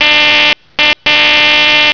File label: door buzz